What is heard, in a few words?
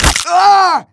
flesh break; Team Fortress 2